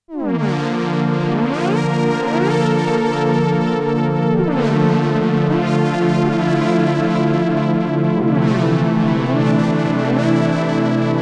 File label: Behringer Pro 800 Mod Strings Reverb